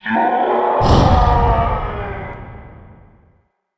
menace level hologram skull; Earth and Beyond

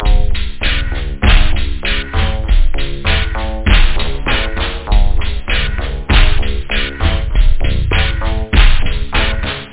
beat, house loop; da capo vol1

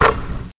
hoop

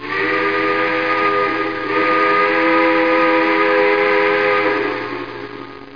steam

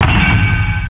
bigfoot

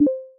ui apply; Rapid Gun; ipsc

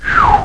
chiu; HyperSlider 1.8 Demo Show

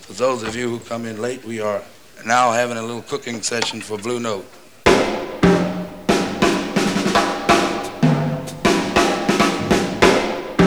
jazz it up start windows; PLUS! Themes

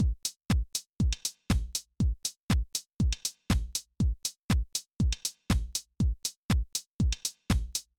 drum; bombogroove 11

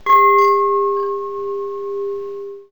bell

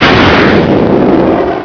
blast

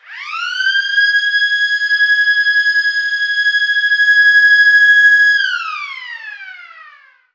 Siren; Superbike 2001

